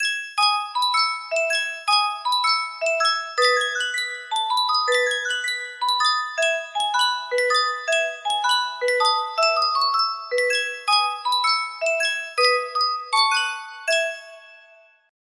Sankyo music box

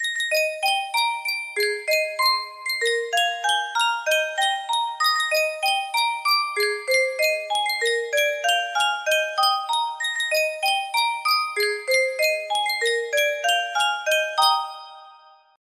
Yunsheng Custom Tune Music Box, music box melody

